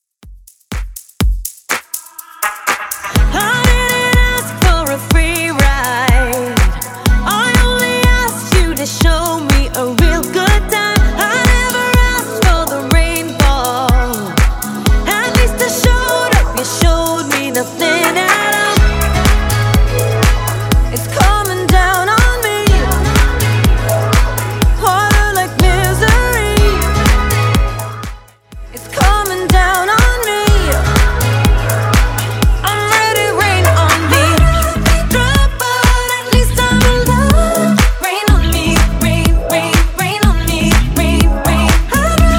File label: Top40 Dj Friendly 123 bpm